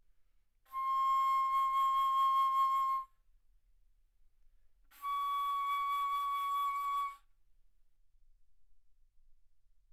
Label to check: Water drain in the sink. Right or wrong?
Wrong